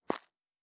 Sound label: Dirt Run Surface, Footsteps